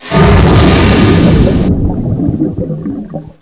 water, weapons air; chaos

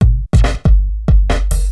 DRUM LOOP BIG BT 140; eJay; BigBeat

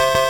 DING; UHOG2000; sbslot

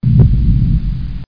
Close Program; Win95; Aliens

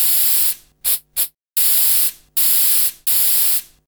hair Spray; Singles; French Version

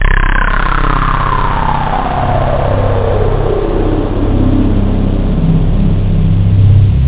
303 frequence slowdown; tb303 samples

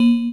donk; Web Clip Empire 150,000

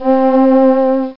chorale, vox; OctaMED SoundStudio V1; jazzies samples

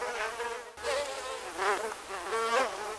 gnats; The Sum of All Fears

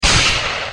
gunshot; BANG!

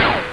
electric shot; Sound Effects for Multimedia - Volume 1

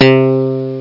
strings; World Of A1200; instruments

